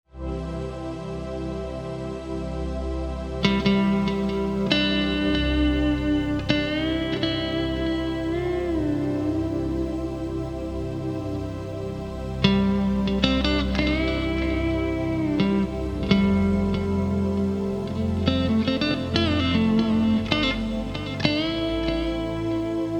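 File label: Crescendo, guitar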